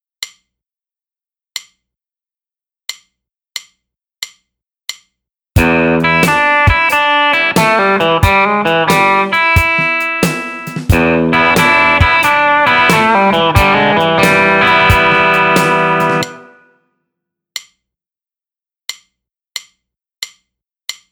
Build Up

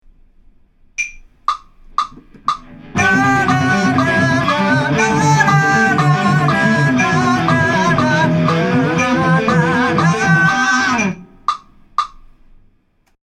vocal

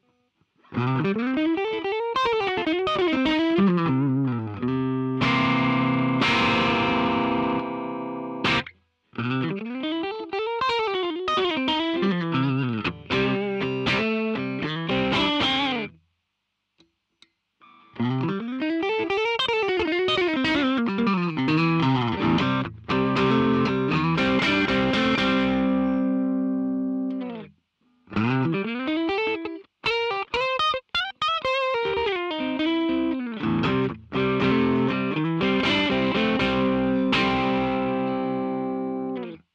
Clean Mix; P-Rails

